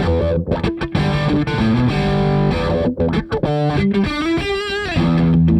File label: DRIVER, Funk Guitar; 90 s Sample CDs; Zero G